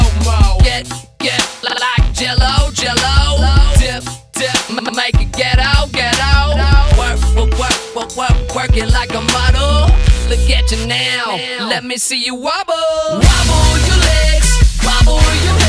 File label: Wobble